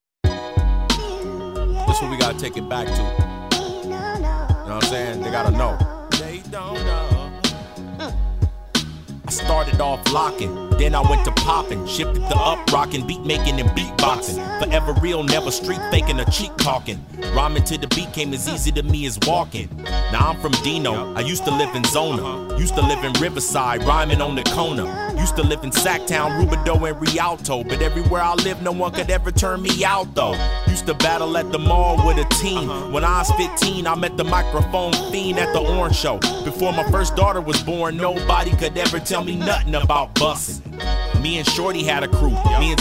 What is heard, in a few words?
hip hop